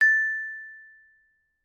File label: Xylophone A